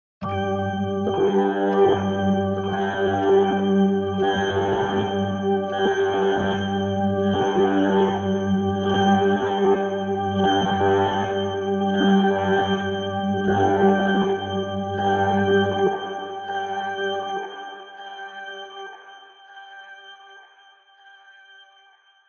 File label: Pumpcrunch Organ